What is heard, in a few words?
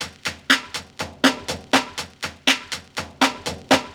Filter Loop, Drums & Percussion, Electronica; Sonic Foundry (Sony Creative Software) - Electronic Point Blank